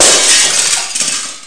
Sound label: glass; quake2